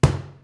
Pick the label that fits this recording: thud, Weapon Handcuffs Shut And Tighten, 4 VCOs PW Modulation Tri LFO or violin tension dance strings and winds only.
thud